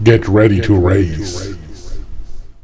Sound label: on Player Start Countdown